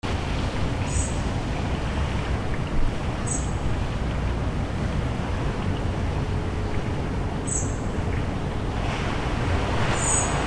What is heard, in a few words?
courtyard and pool; germany berlin steigenberger